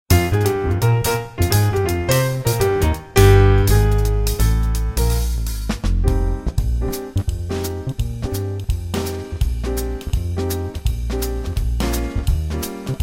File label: rhythm minus